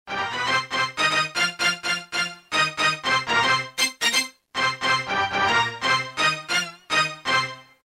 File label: Ragga Hit; Roland M-DC1